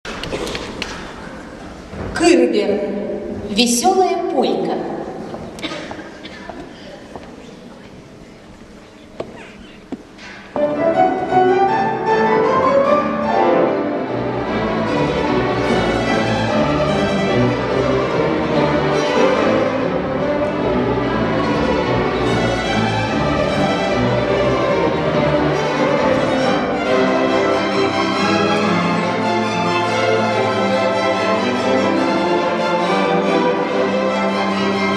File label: polka